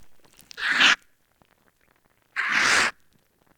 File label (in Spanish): toma mate